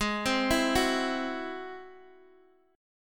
G sharp Augmented 7th G sharp Guitar Open G

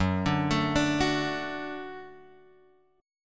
F sharp Minor Sharp 5th, Guitar, Drop D; chordshape; down

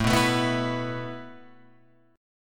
A Suspended 2nd A down Guitar Open F; Asus2 strummed

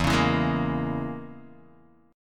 E 6th Flat 5th E down Guitar DADGAD

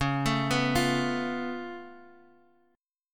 C sharp Augmented 7th C sharp down, chord; C#+7; arpeggiated; JGuitar

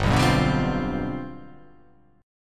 G 11th

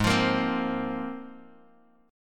G sharp 6th Add 9th G sharp Bass Standard; strummed; JGuitar

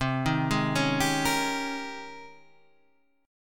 G sharp Major 11th C

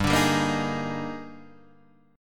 Eb Major 11th G, guitar

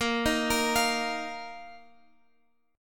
A sharp Suspended 4th Guitar Open G

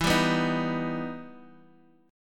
E Minor Major 7th, Guitar, Open D; x,x,2,1,2,1; down

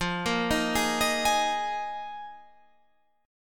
Eb Suspended 2nd Suspended 4th

